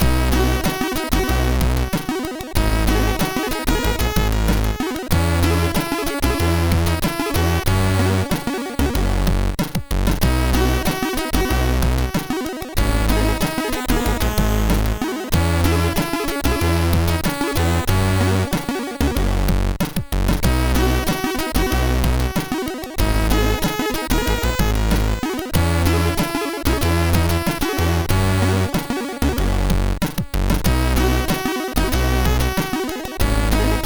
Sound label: Relaxing; MOS6581R3; Ganja